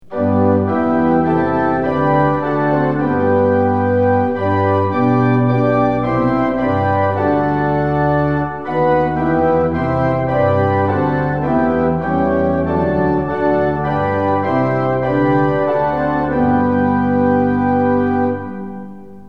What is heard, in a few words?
tune; hymns; lillenas